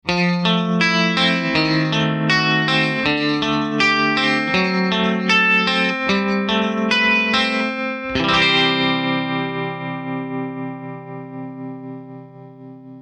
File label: TREMSTER